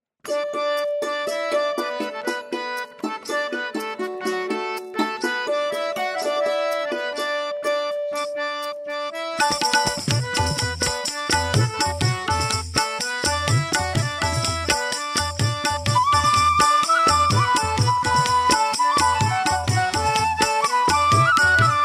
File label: Ladies Karaoke D Pancham